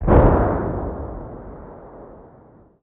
hit far; World of Tanks Blitz

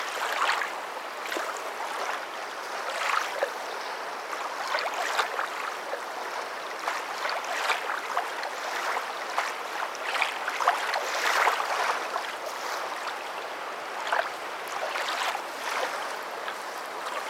ambient; World of Tanks

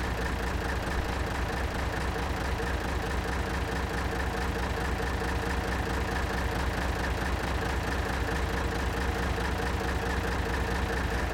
tigerdiesel engine int rpm 00, tanks; War Thunder

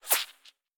bullet passby; War Thunder

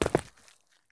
landing asphalt; Escape from Tarkov

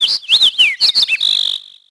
sparrow far; World of Tanks Blitz; ambient milbase

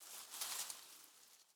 leaf rustle crunchy med

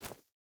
footstep burlap forest jump start; Rust

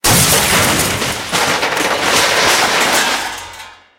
car wreck, tanks; War Thunder

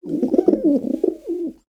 Amb Animals; Red Orchestra Ostfront 41-45

